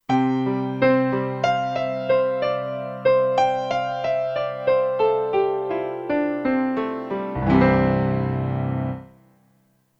Kurzweil K2500X; Factory Setups

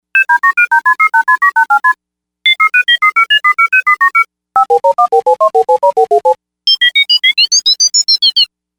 Hello OB, Novation UltraNova; Factory Patches

